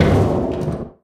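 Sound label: panels impact small, metal; Portal 2; Source Engine; video games; physics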